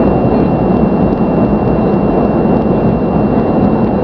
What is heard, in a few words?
fly; quake2